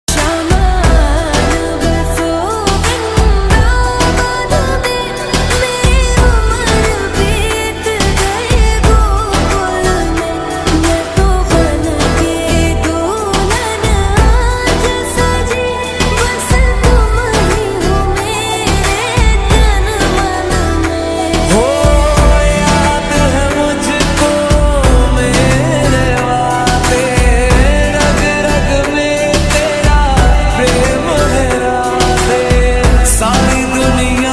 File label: bhakti song ringtone